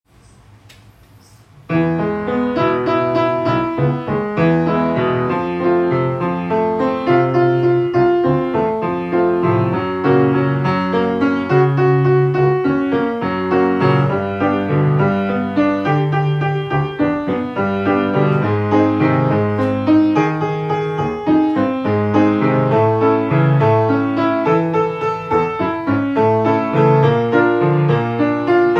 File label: Repeated Octave